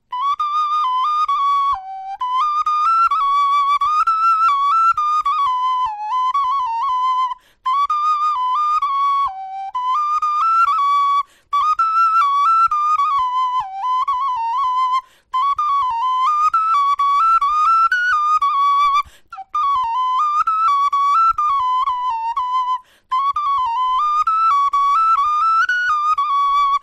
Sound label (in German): melodie